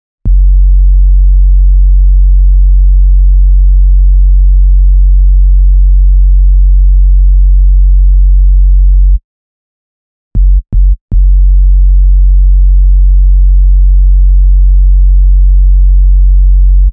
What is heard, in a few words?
Deep House Bass Note